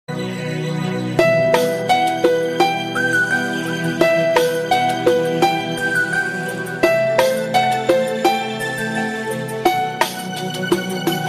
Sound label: Love Instrumental Ringtone